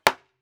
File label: archery foley arrow impact wood